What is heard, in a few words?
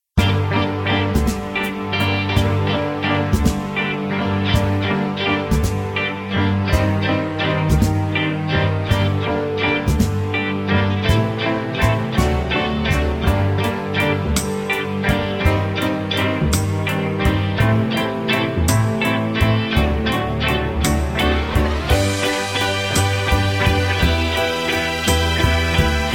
G No Backing Vocals, backing tracks